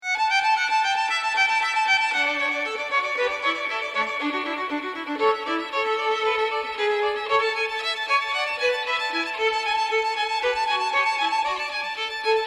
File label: string quartet